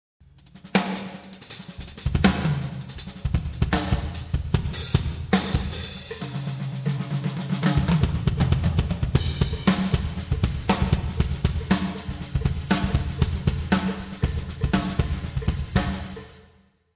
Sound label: fills